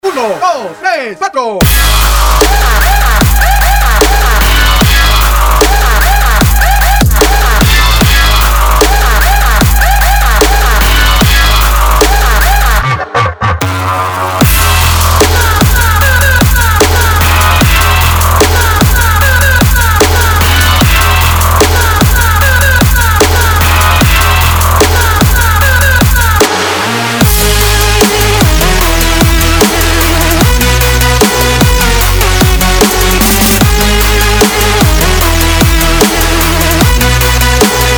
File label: trap drills